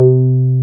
303, TB-303 BASS

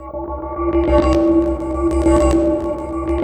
loop, 150 BPM, drum & bass; Ueberschall; Drum & Bass Resonance

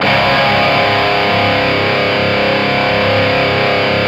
D 3, Soldano rock guitar; Spectrasonics; Hans Zimmer Guitars VOL-1

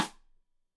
Side Stick Stereo; Deeply Sampled Drums

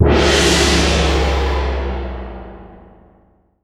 WATER GON, GONGS; Voice Crystal - Steve Reid Definitive Percussion

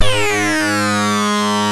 RUMBLE REZ G1; E-MU Formula 4000 Series Vol. 3; Analog Odyssey

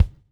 BUBL, Backbeat; Spectrasonics - Backbeat

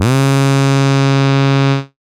c#, Analogue FX, Dance Synths

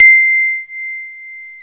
PNO FM, Piano Layer

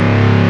SCRATCH, Prophet Five; E-MU Formula 4000 Series Vol. 3; Analog Odyssey

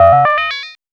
MOOG HAT; AMG - Vince Clarke Lucky Bastard

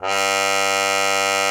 cup mute G2; Xsample VOL-3

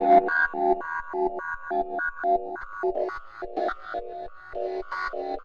Ring Mod, Cymbal, contact mic; Murf & Ring Mod

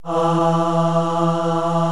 CHOIR E3; VOCALS; Greytsounds VOL-1

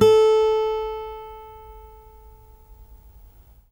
ACSMEDPICK, Guitar; Quantum Leap; Guitar & Bass

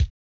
deep dry bass, Percussion; Giga Samples Collection; EMUSP12

